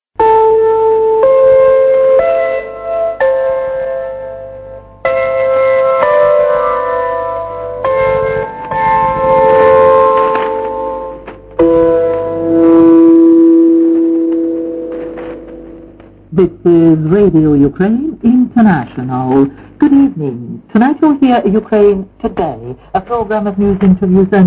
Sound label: interval signal